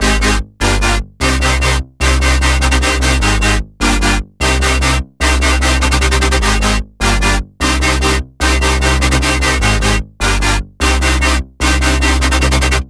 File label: Future Bass Drop Loop 150 BPM C Min, Synths